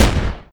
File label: machinegun